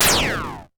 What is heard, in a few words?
laser beam phew